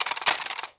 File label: m249 box in, weapons; Counter Strike Model Pack; CS:GO